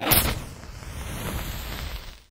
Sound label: flare on